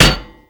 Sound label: Cast Iron Hit; Gameplay; Awakening Remaster